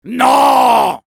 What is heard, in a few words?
Soldier negative vocalization, fr